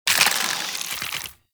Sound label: Draw; Warframe; Infested; Derelict Cernos Prime